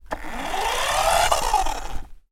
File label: toy car radio controlled drive short distance stop